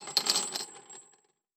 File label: Chain; Fantasy Sounds Bundle; Craft